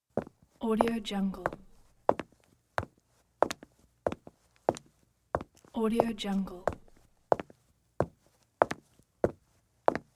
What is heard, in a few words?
male walking